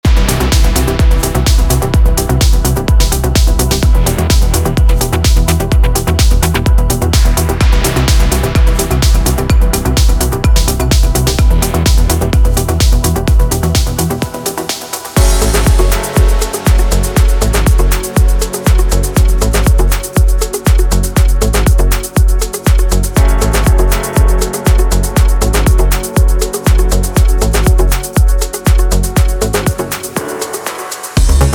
Tracks Made With Deep Premium Samples, drum sample pack; Production Music Live; Deep Premium vol 9